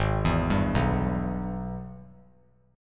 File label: Ab Minor Major 7th Sharp 5th, down; arpeggiated; JGuitar